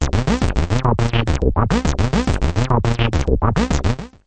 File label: SH101